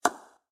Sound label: high toned and short drum hit; artlist original